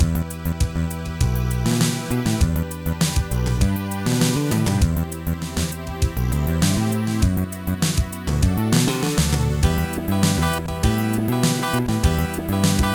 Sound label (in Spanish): guitarra electrica; Trackers; Modland